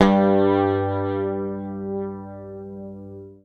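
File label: bass, G2, left channel; PICK; Sampleheads; Will Lee Bass Library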